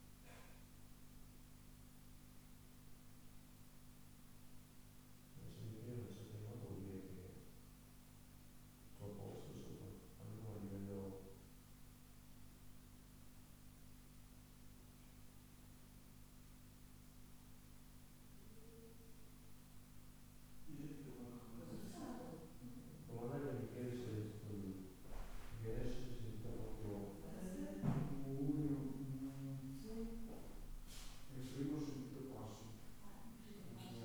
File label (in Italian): suono emesso interno edificio simile ad un lamento non esaminabile